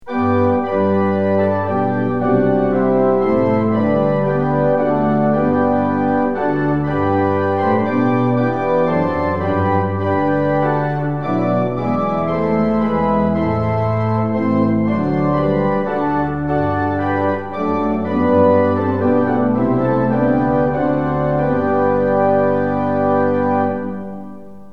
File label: tune; hymns; lillenas